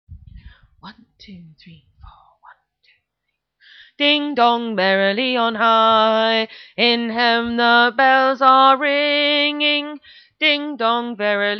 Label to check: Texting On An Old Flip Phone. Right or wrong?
Wrong